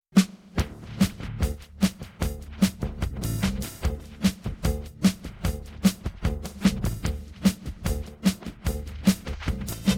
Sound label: sultans Drum